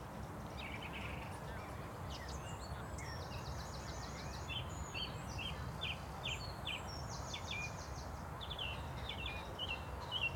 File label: Amb Uk-Rural-Birds Loop